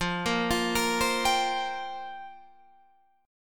F Suspended 2nd Suspended 4th F down Guitar Standard; arpeggiated